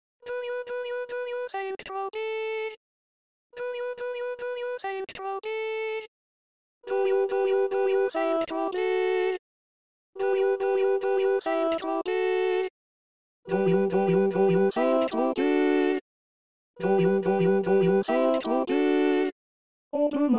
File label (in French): 3 voix